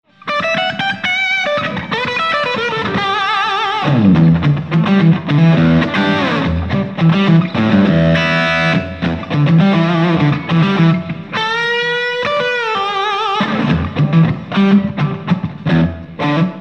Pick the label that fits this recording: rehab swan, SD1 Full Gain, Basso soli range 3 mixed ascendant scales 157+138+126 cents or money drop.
SD1 Full Gain